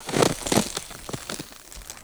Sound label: soft powdery snow falling from tree